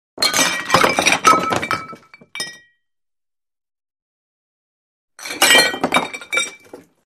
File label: Dishes Knocked Over; Hollywood Edge Foley Sound Library